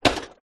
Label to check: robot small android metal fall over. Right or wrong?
Right